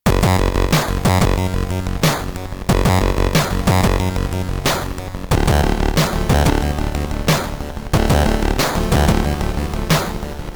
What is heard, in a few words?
Bouncey Intro; high strangeness; wii u